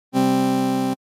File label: Synth With Short Release